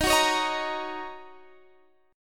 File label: D sharp Minor 7th D sharp, down, Guitar, Standard; D#m7; strummed; capo=9